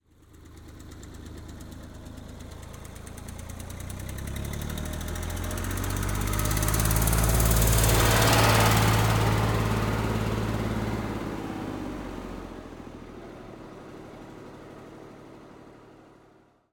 Eicher Tiger II exterior Pass By Fast Constant Speed, Tractor; 1962 EM235; MKH8040-MKH30; Dramatic Cat